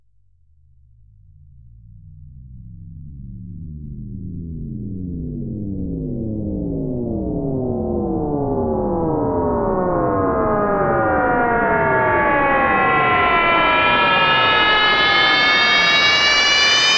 Sweeps Long

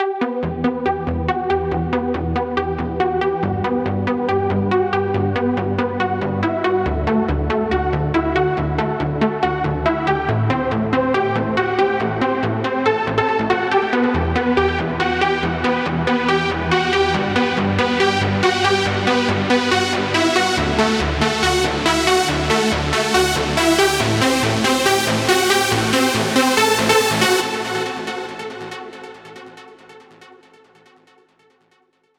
Mainlead Intro 140BPM, Eminor; Vengeance Samples; Incast Kit

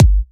Bassdrum; Vengeance Samples

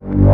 Offbeat Bass G; Vengeance Samples

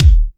Bassdrums

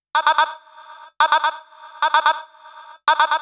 House Loops, Vocal Loops House 128BPM

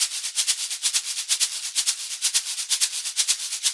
Percussive Loops 128BPM; Vengeance Samples

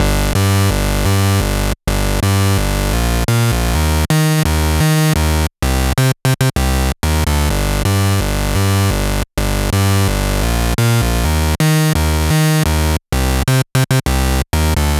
Bass, 128 BPM; Vengeance Samples; Vocal-Hook Kits; Song Kit; Freaky Boys

